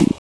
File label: 808 Snare, Kit; Sonic Foundry (Sony Creative Software); Esoterik Beatz